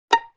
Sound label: Pizzicato One Shot; Vintage Cartoon; Musical Elements